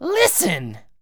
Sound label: TCrow, Crow Sounds